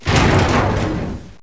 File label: break door Metal